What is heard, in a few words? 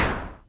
SYNCUSSION